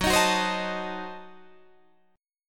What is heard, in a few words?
Gb Diminished 7th Guitar Drop D